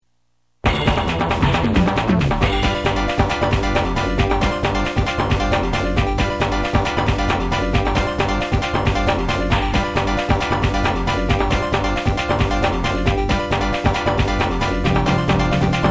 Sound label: techno tower